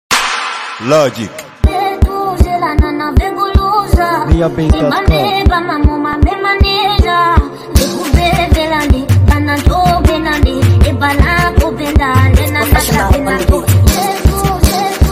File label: Professional Beat Angels Spirit Chant